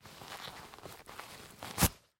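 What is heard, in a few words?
baseball sounds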